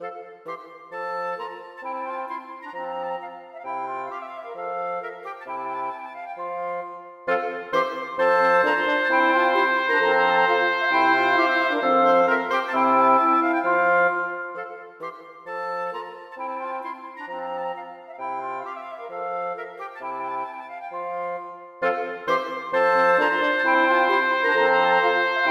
Gavott for blåsekvintett; Hertz

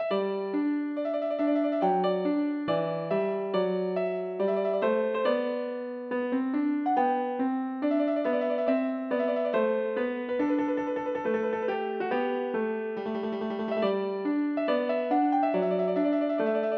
Intro for piano - impro; Bang

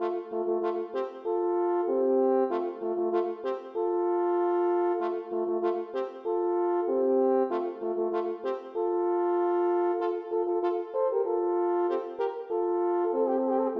For to horn

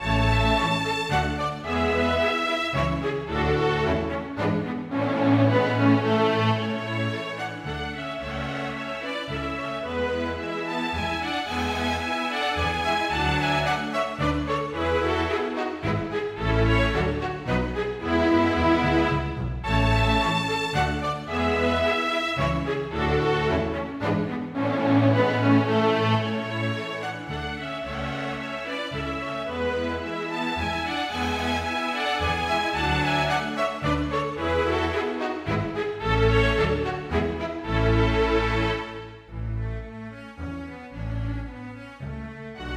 Menuet for strykekvintett

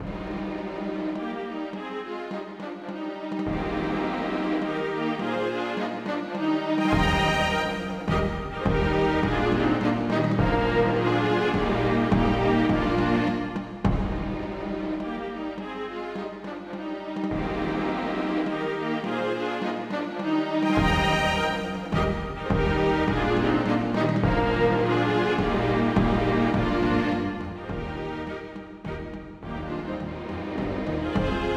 Menuett for strykekvintett og trommer; Møller

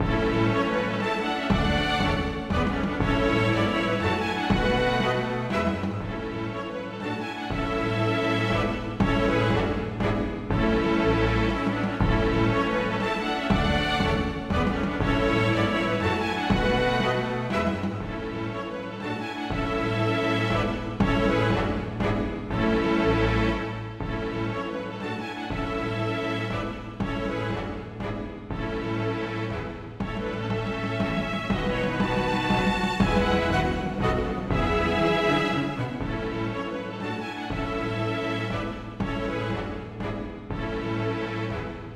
Menuet for strykekvintett med ad lib trommer, m trommer

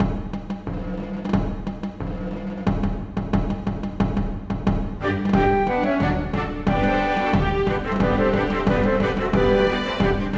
March for fløyter, strykere og trommer; Aamodt

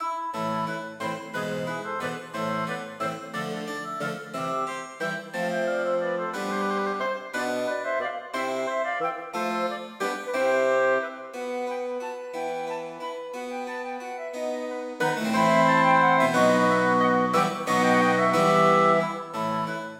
Gigue for blåsekvartett med cembalo; Hertz

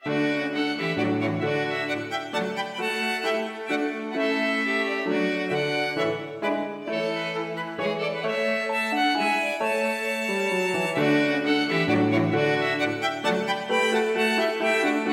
Menuet for to fioliner, cello og piano

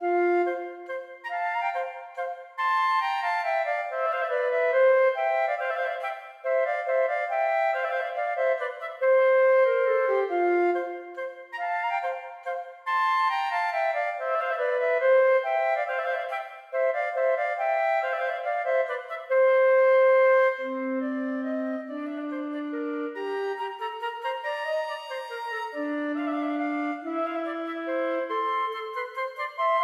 Menuet for fløyte duett; Aamodt

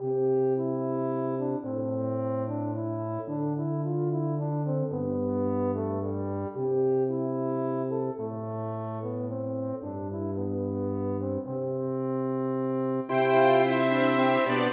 Arie for stort ensemble; Aamodt

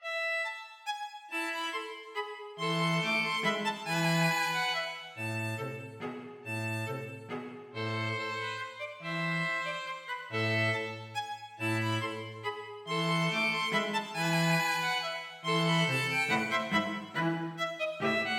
Menuet for to fioliner og cello; Aamodt

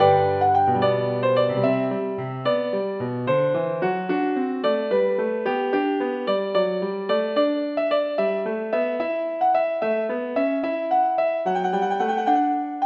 Gigue for piano; Aamodt

